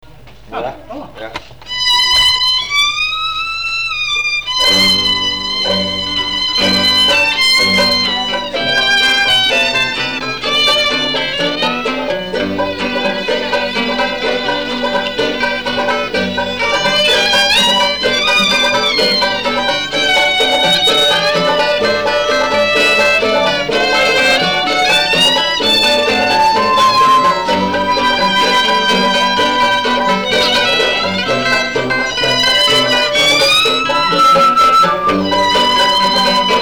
Krontjong; Toegoe; Pieterberg; Westerbork

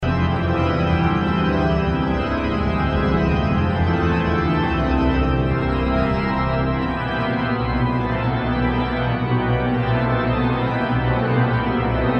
Organ Playing; Father Willis

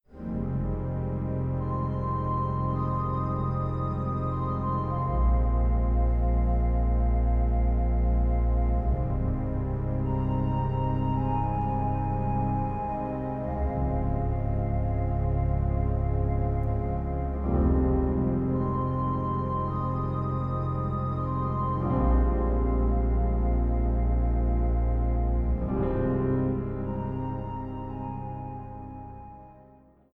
Grazioso

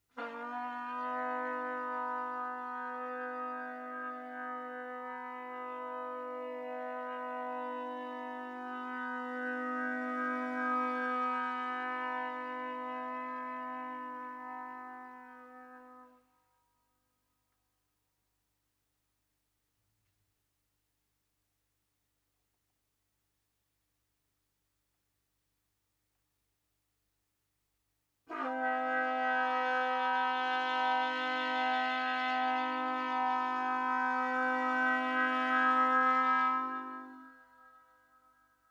Whale Trumpets

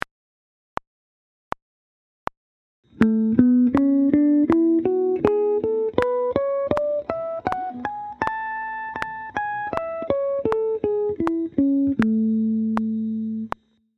Introducing Harmonics Part 3

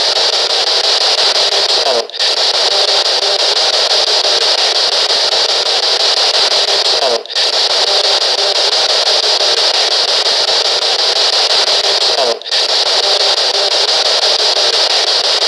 EVP; Finska

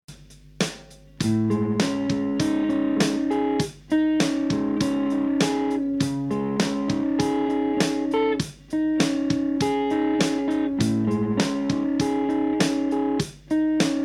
Full Speed

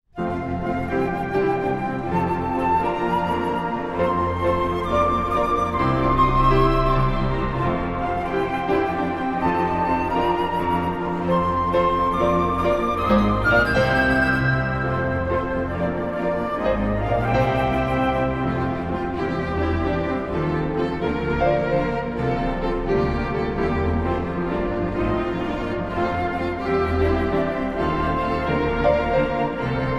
intrada; er is een heer

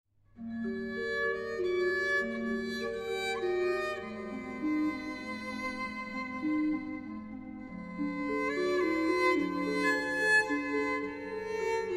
Siciliano

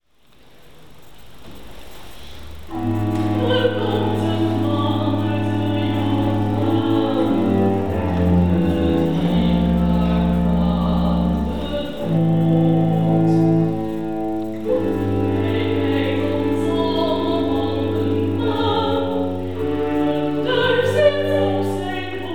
recitatief alt

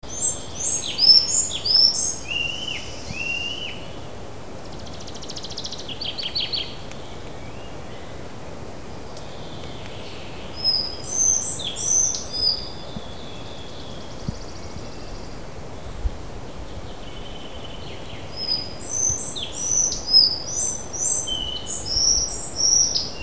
Collared Flycatcher

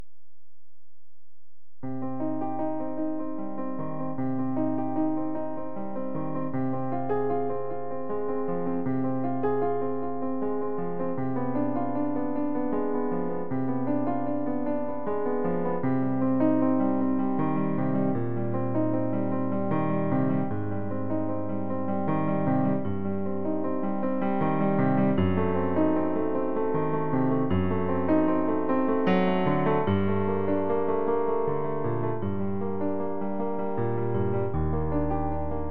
Piano Prelude in C Minor; Piano Tutor Book 6

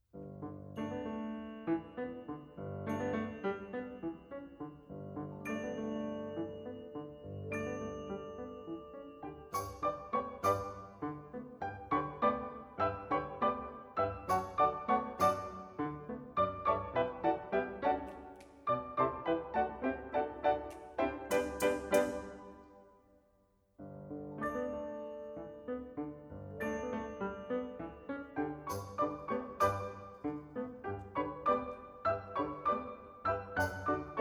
Symphonic Dances, Cha Cha Andantino Con Grazia

